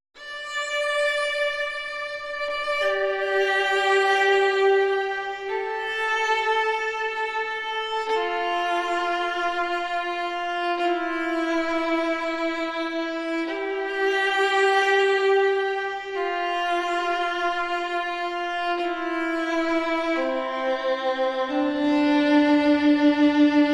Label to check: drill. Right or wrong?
Wrong